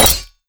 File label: scythe break